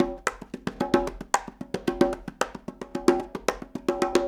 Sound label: Raw Funk Congas, 70s Analog Funk; Sonic Foundry (Sony Creative Software); What it Is! 70s Analog Funk